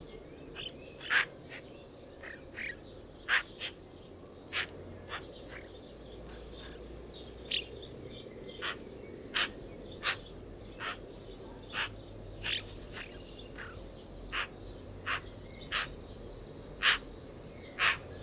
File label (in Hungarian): szarka Pica pica